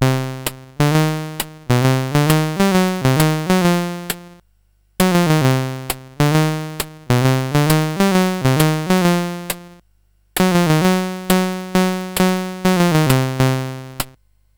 sid, CSG8580R5; FlaschBier

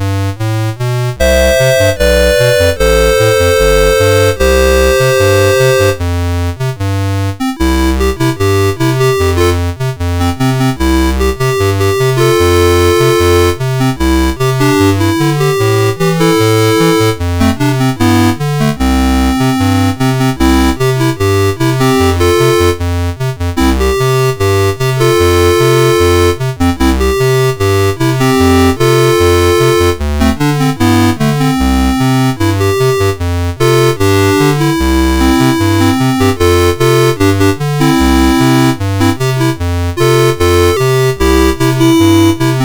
MOS6581; MOS6581R4; Garden Party A

